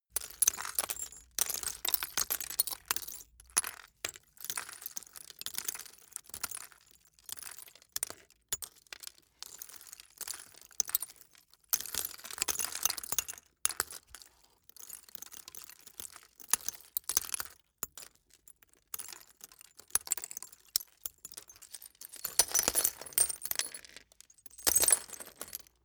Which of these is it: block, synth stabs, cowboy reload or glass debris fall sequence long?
glass debris fall sequence long